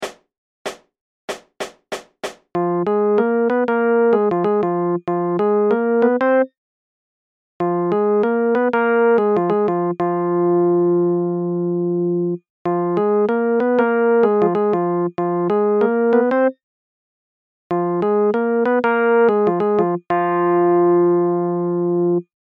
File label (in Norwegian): sau melodi